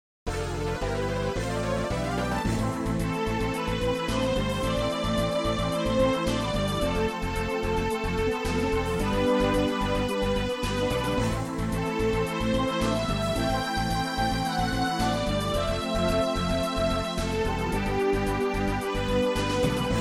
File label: MIDI Battle